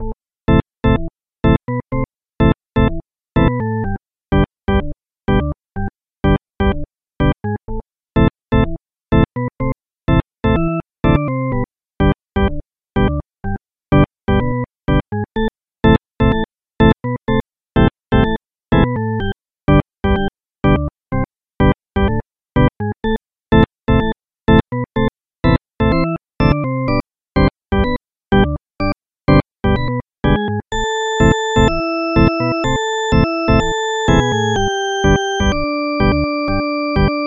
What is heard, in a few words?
melody